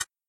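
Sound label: button click; Word Connect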